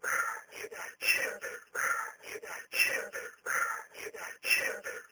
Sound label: EVP Loop